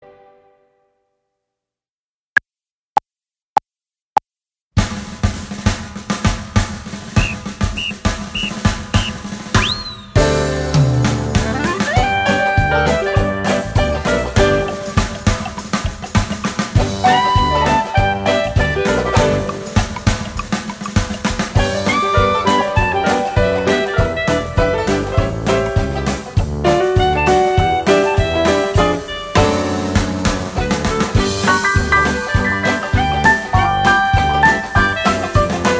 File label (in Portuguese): When The Saints Go Marching In Sem Flauta; Nivel 4; 4.o Ano de Escolaridade; Obra Obrigatoria; 11o concurso de flauta de bisel municipio de redondo